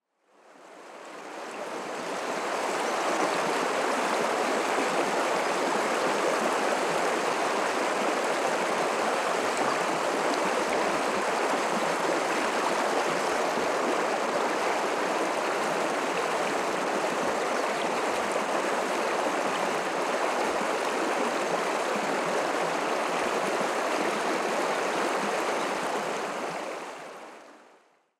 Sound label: river fast flowing